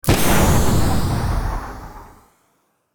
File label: Rocket launcher single shot; artillery